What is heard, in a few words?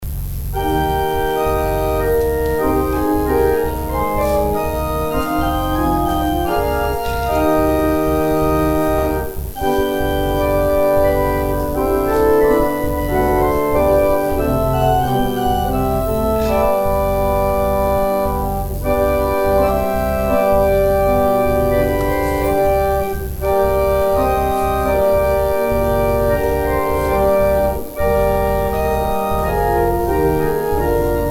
organ interlude